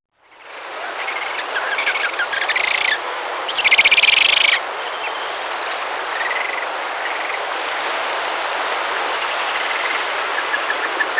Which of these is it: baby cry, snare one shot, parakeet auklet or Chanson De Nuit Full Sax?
parakeet auklet